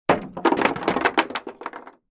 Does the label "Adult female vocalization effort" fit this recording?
No